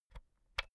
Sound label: pull out usb b; objekte; elektronik